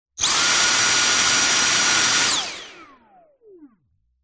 household vacuum cleaner dyson v7 stick short burst close up motor